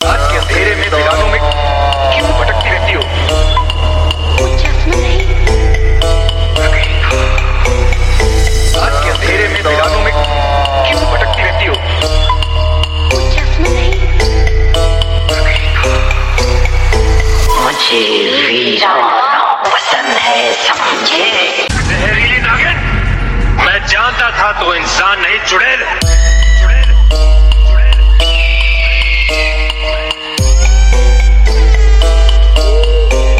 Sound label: Trap Trance Mixx, Hindi Dj Song